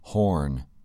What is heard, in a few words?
horn